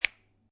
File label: Billiard Pool Snooker Two Pool Balls Clacking Together